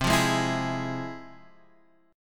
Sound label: F Minor Major 7th C, guitar chord